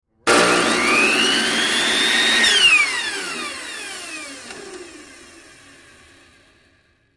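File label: High Speed Large Table, saw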